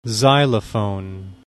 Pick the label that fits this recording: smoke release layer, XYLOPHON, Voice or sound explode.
XYLOPHON